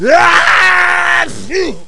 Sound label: sneeze